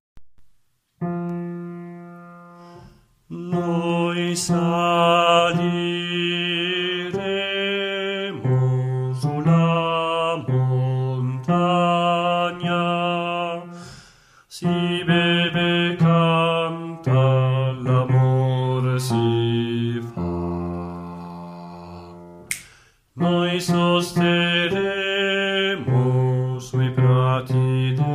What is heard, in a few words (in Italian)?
bassi